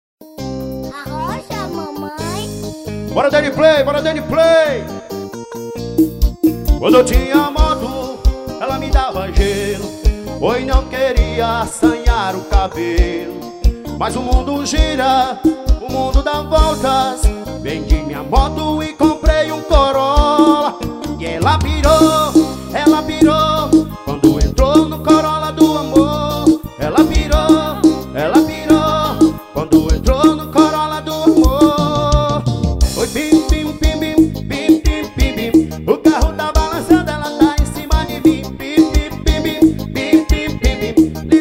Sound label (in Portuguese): forro